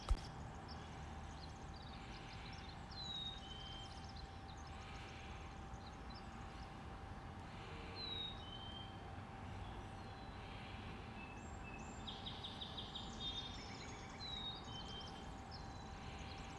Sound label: Black capped Chickadee; Rich Sobel